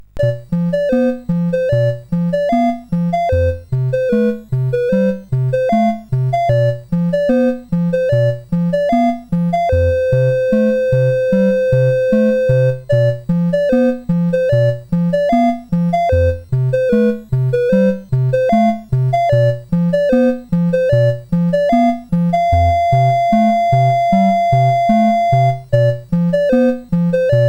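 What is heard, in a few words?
Magicians Ball, MOS 8580 SID chip music, games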